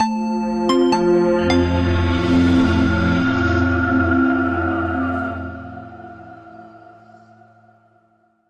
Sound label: Sonic 4 Note